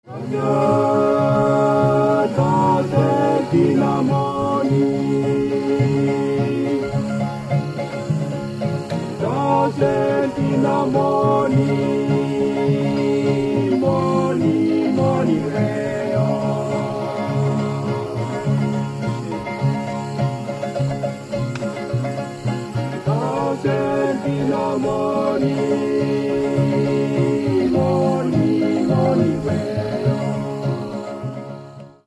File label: string